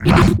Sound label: Scifi Alien Grunt Swing; Energy & Forcefields